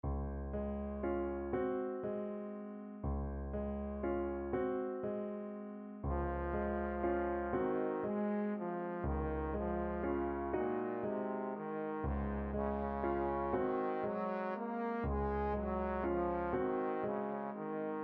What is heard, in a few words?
berceuse op 57, trombone